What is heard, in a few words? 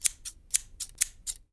SCISSORS